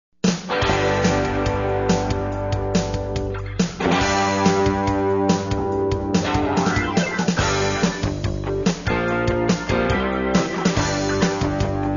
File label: Classic Rock